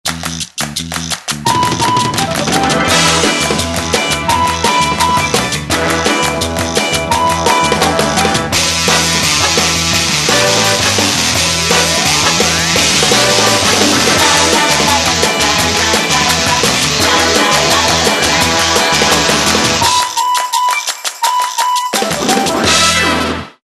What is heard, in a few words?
Circus; Motorola RIZR Z10; ringtone